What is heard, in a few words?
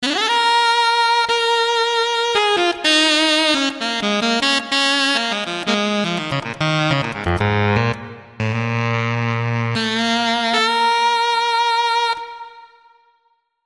ALTO